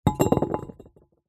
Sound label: foley bottle glass large empty set down wobble concrete